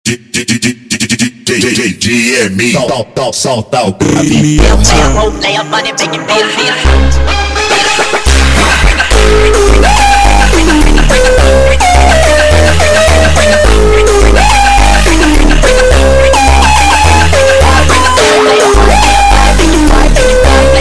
Funk super Slowed

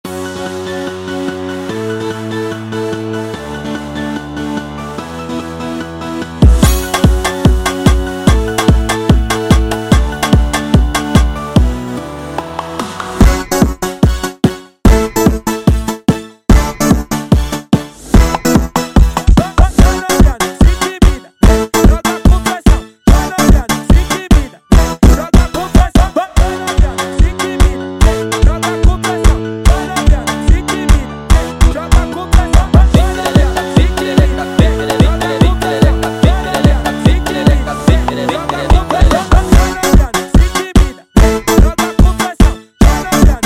Funk sped Up

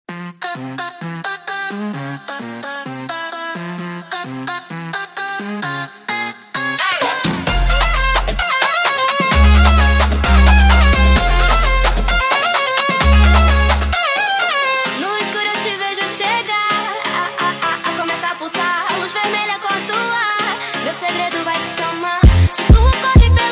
Montagem sped Up

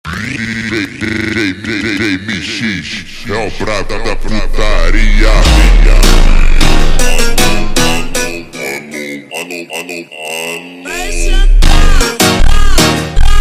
Montagem ultra Slowed

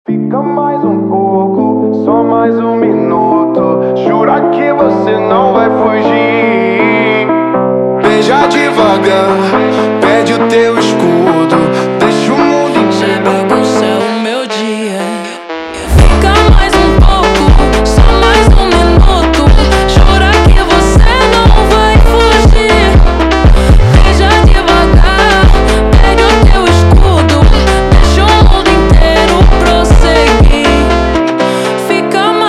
Montagem slowed

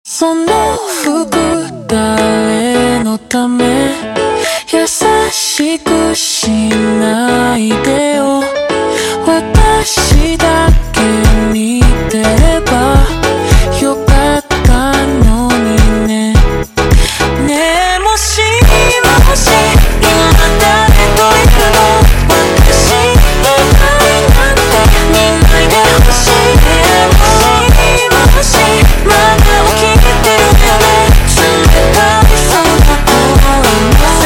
Montagem super Slowed

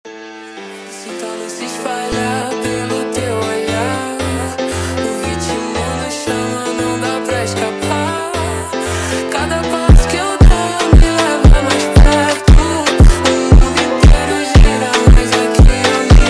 Montagem Slowed

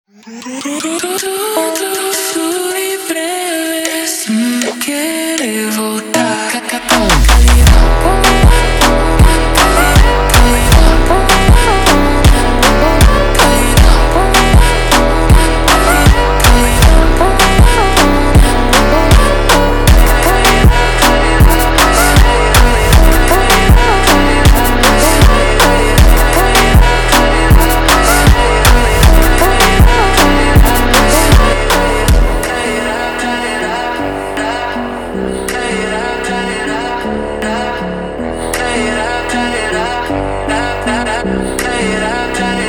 Montagem slowed

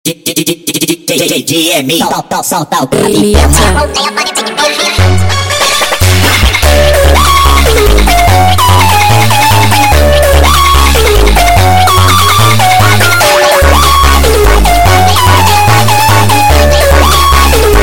Funk sped Up

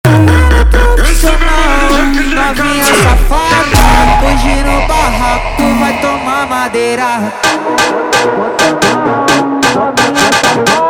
Funk

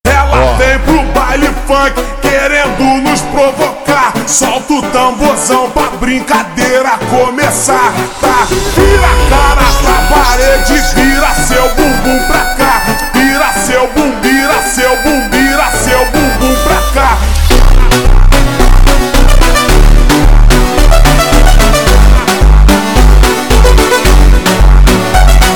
Montagem Slowed

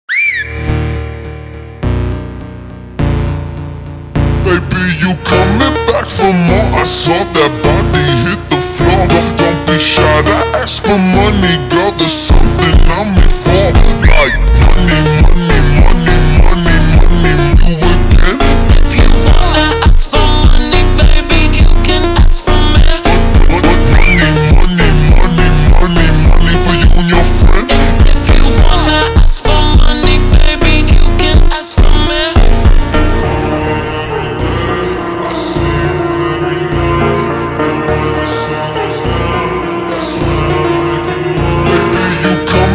Montagem ultra Slowed

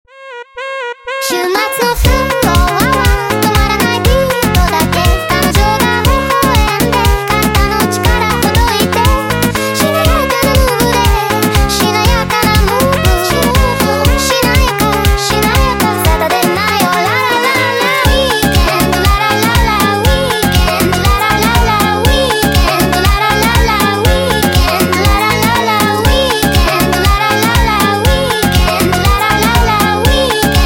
Easy Steps sped Up

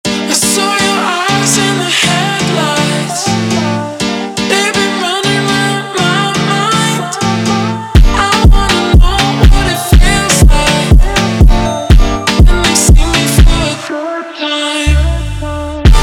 Montagem slowed

